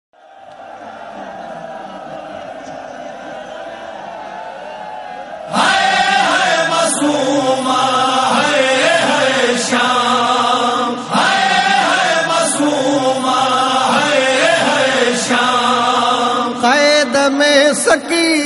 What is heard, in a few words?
Sindhi Osara Nohay; Bibi Sakina; NAWHA 1444